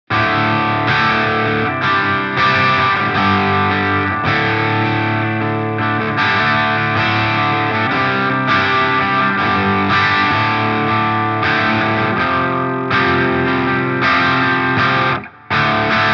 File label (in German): Royal Flush linke Seite Gain 12h